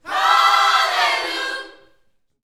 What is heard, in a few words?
Vocal Gospel PHRASE